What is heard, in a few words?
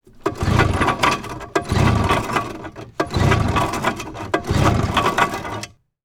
boat outboard motor pull cord; transportation